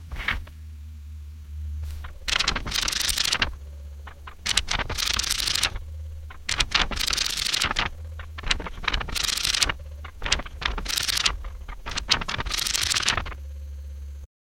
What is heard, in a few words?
very very fast page turni